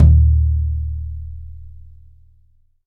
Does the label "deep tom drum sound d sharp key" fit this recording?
Yes